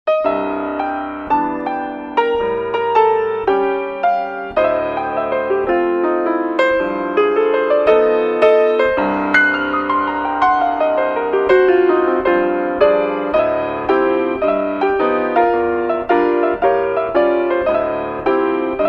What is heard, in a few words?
piano jazz